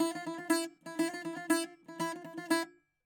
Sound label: bouzouki